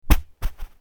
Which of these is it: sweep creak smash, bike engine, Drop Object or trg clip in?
Drop Object